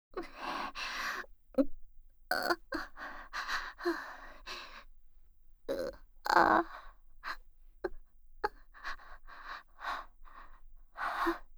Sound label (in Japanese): オナニー電話の喘ぎ声ループトラック, ASMR